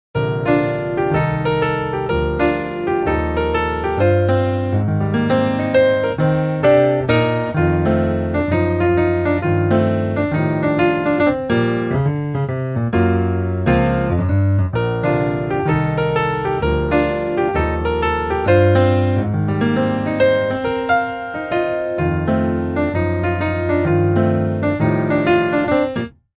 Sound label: Piano Ringtone